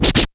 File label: scratch, Yamaha TG-500